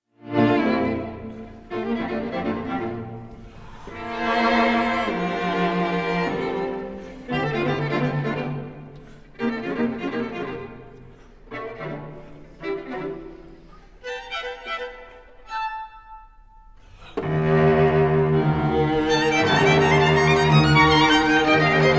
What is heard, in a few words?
string quartet, quartet no 1 in D major op 25 for strings; benjamin britten takacs string quartet